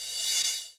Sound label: CRASH, Dance Drums; Best Service; Dance Mega Drums